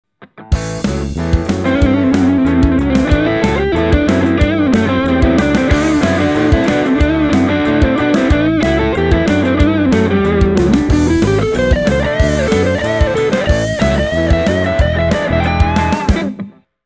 rock n roll

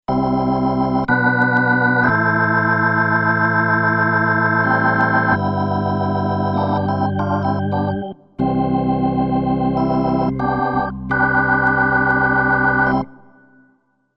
Crumar Mojo Desktop Funky Comping

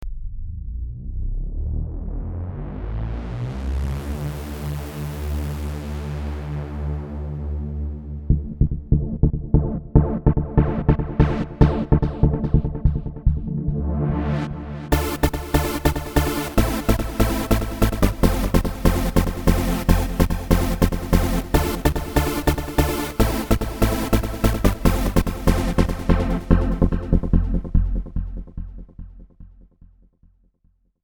Lead

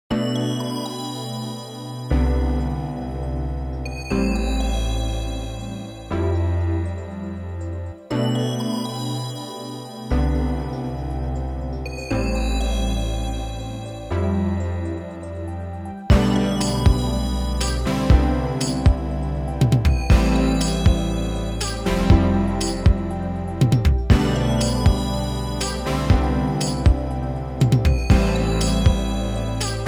Hardwaresynths dry; Savant Audio QRS

